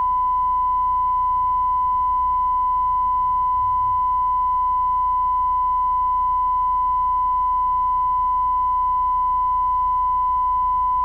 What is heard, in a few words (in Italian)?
Calibrazione bk4100 94dB; Car noise; Dedra